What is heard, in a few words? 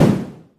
punch